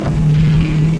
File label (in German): HYDRAU; Best Service; Advanced Mega Effects